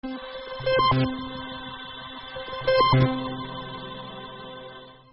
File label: ARP